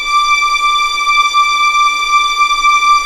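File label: D6 legato piano, violins; East West; The Ultimate String Collection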